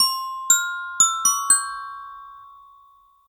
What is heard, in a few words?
Gliding Nightingale